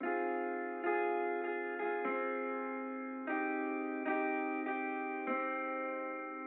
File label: Guitar, 148BPM, Sad Chord